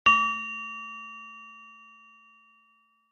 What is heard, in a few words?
wrong